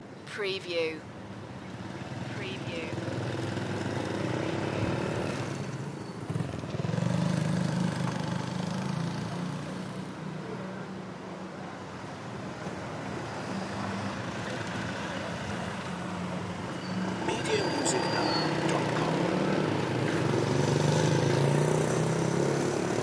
motorbike crossroads ambience; loop

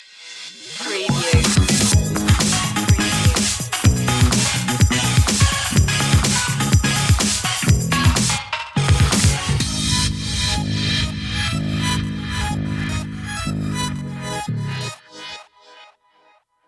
funky mario stinger

